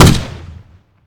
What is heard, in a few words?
lead ball fire